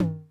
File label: Tom Mid Accent High Tone Mid Decay, 909